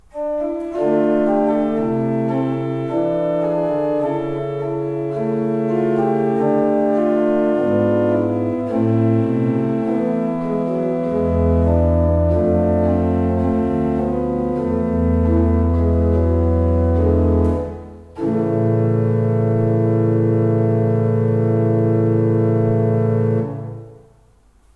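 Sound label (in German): Orgel, pfeifenorgeln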